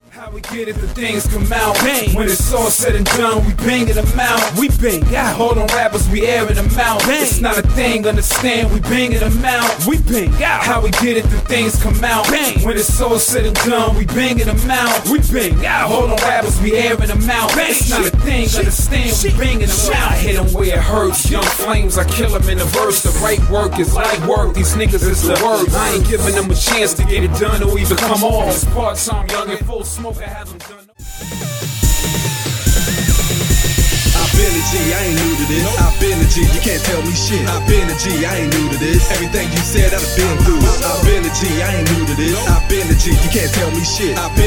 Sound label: Hip Hop; Jango Hip Hop Demo